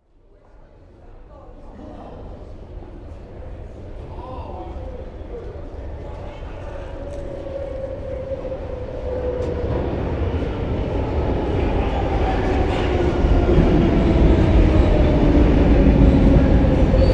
Train Subway Underground Up stop and away medium shot; doors open and close - passengers off - background announcements